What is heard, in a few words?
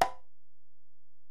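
Acoustic Percussion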